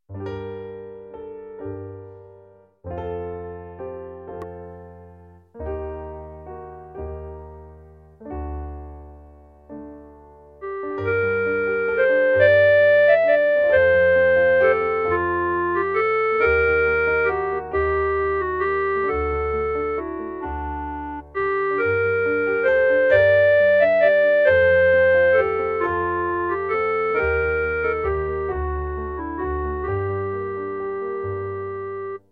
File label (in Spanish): CLARINETE